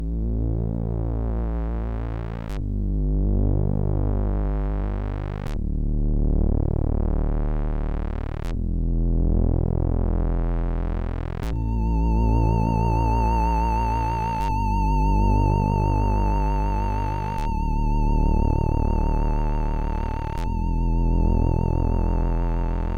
Bells, sid, MOS6581

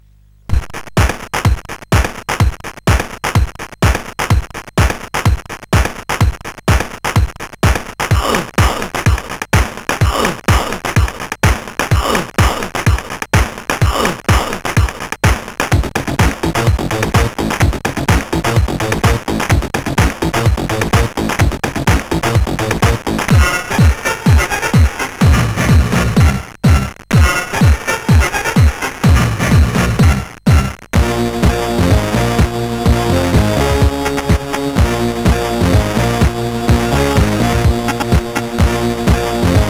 Digi sid CSG8580R5

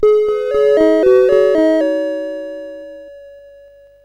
Pipe Mania, CSG8580R5

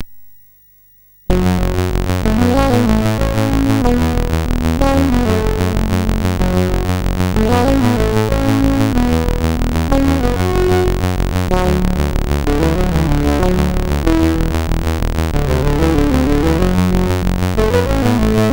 Music Demo; Doomdark; MOS6581R4

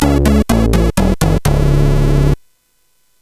Ingame Jingle, CSG8580R5

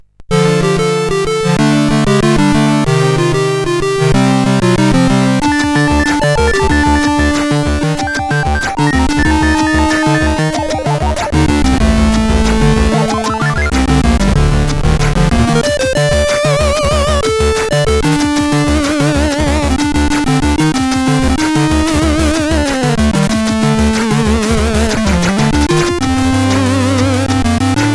Dusty Action, CSG8580R5

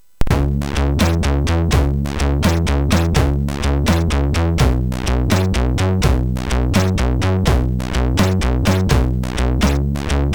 MOS6581R4, GAMES; Solitaire

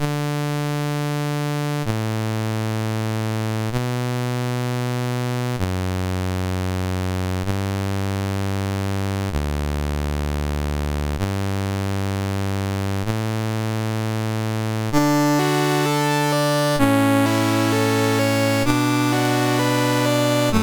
Canon in D, MOS6581R3

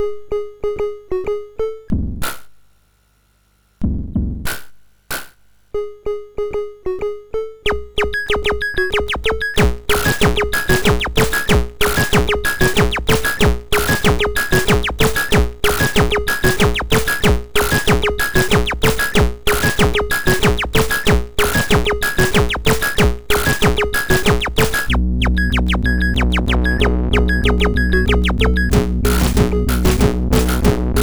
Best Beat, MOS6581R4; hvsc

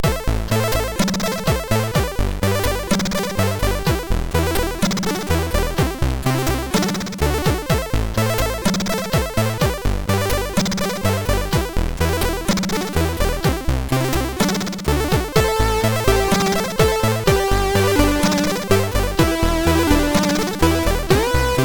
melody wave, MOS6581R4

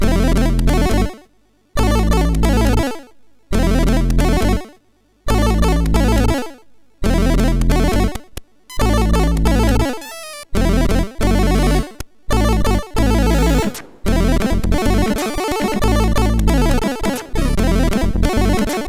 Intro sid MOS6581R4

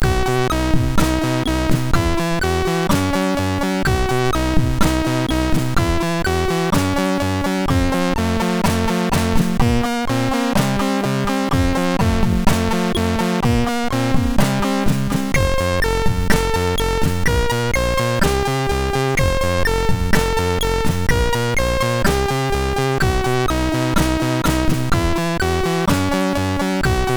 intro, sid MOS6581R2; XLCUS News 5

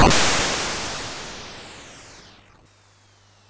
Frantic, MOS6581R4

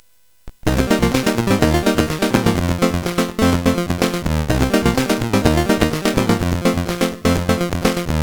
sid 8580R5; Game Musix; hvsc

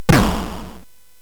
SFX; Narco Police; hvsc

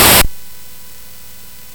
Ducks Ahoy, CSG8580R5

